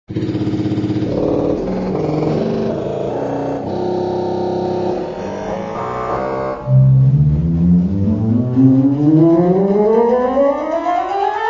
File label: church organ; ElectronicMusic